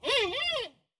熊猫担忧声

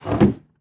木桶关闭声

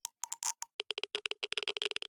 玄武岩三角洲咔嗒声; ambient

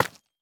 方解石破坏声

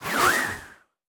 空中待机声; 旋风人

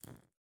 蜡烛环境声; ambient

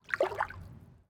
海豚游泳声

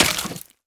受伤声; 沼骸

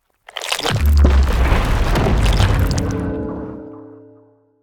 挖掘声; 监守者

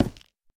下界木破坏声

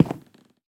樱花木踩踏声

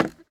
竹踩踏声

